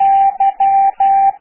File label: Y morse code